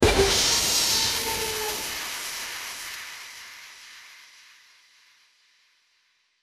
dramatic crash sound; clumsy

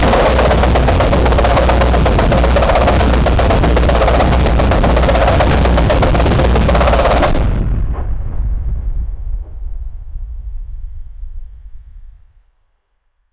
sounds of war battlefield, artillery

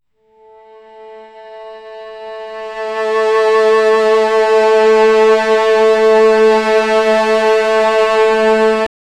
string ensemble octaves, one note

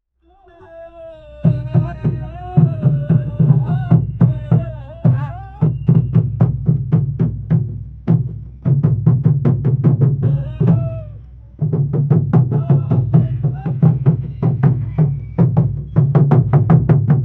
sound of play drums and, ceremony

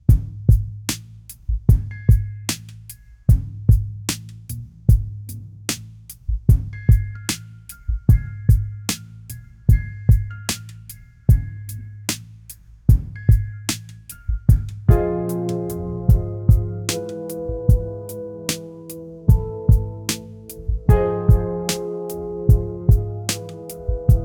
slow lo fi chillhop track, electric piano

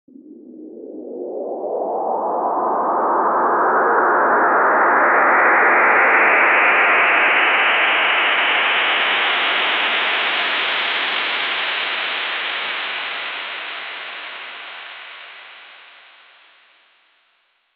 electrical spark

